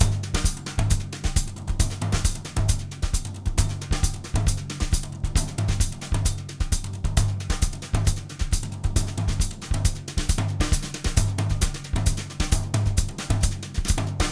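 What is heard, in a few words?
brushes samba; BRUSH LOOPS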